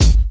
old school rap kick sound g sharp key

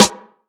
reverb acoustic snare sound g sharp key; snare drum

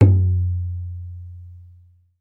low tom drum f key; tom drum samples; one shots

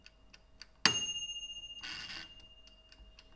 oven ding - electric oven; field recording

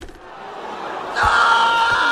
yells angrily

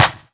sizzly snare b key; sound; snare drum samples

